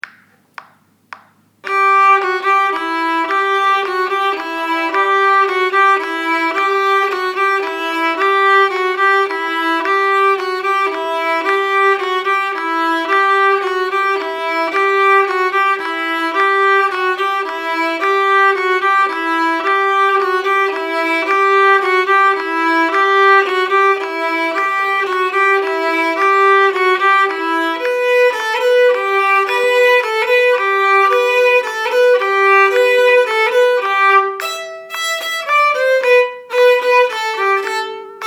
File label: Ukrainian Bell Carol